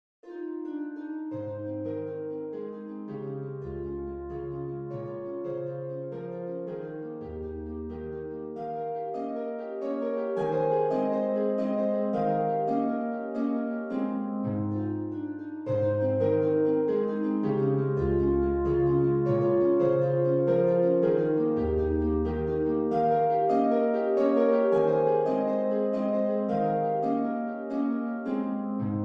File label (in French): valse; beethoven ludwig van